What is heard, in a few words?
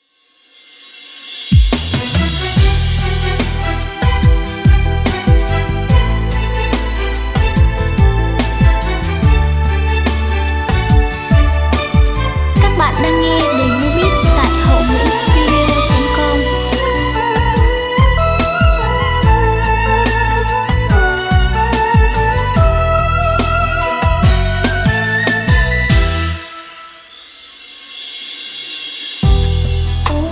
beat, playback